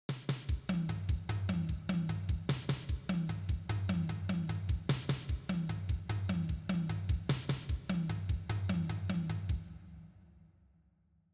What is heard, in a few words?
triplets